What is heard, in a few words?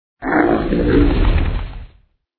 sharkman attack voice bottom